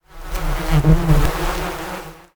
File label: bees; 摇钱树; ITEMS